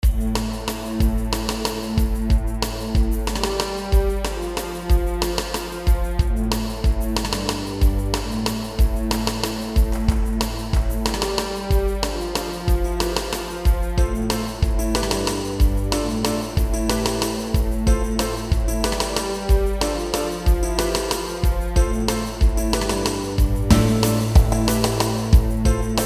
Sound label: forest jazz; zelda 64